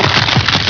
crunch; Graal

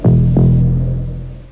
BOMBOM, SCARY

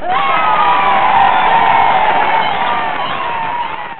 Cheers

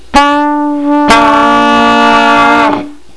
FLUGEL

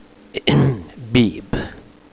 AHEM

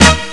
High Score; TileFall

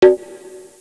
CONGAH; FISHWISH

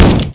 FLING

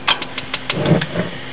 GAS; 999wavs

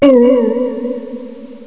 hit bump

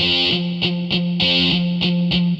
GUITAR, ROCK POP; MAGIX

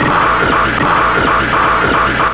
WIND

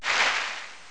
WHOOSH

